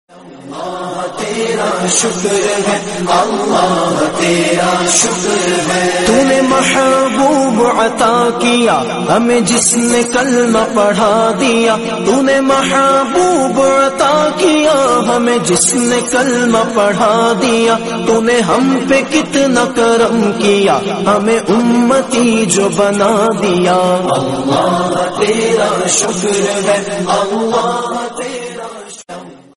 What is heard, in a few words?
islamic song